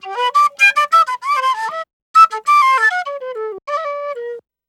Penny 98 bpm G, Penny Whistle, African

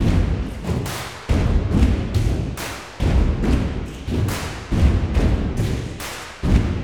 Crowd Effects

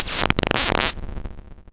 Packing Peanut Snare; Cwejman S1; DevSnd Cwejman S1 - JK

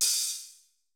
cymbal, Roland MC-909

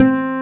W BASS C3, Bass Guitar; STACK BASS; AKAI S6000 CD-ROM - Volume 1

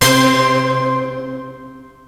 BELLKAGONG, ENSONIQ VFX; Rubber Chicken Software Sample Bank

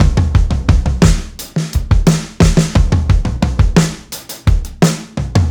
87 TOM GROOVE, drums; Basement drums vol 2

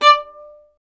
STR VLN, Solo Instruments; Miroslav Vitous

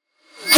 FX Snare R, Reverse; Processed; Antidote Advent; Infected Track Drums Ableton Project

